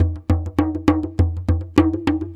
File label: Java Gourd Fast; Ambient; Sonic Foundry (Sony Creative Software); Ambient Atmospheres & Rhythms